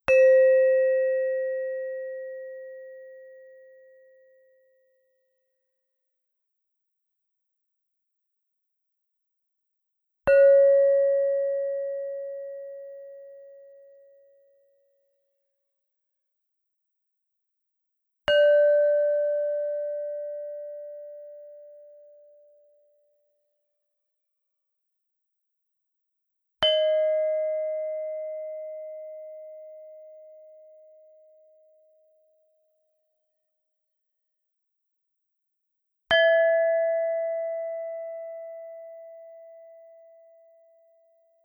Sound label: chrom saron, gamelan; sounds of mtb